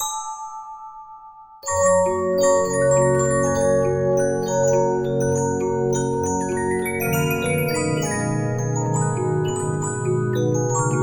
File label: Regina, 15inch disc musicbox